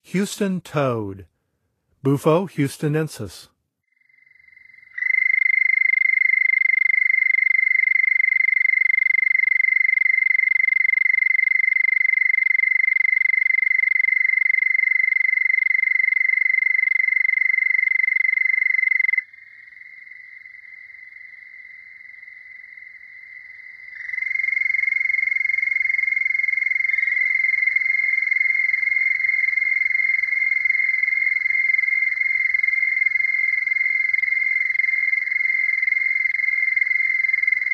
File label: Houston Toad; North America; Songs of Frogs & Toads of North America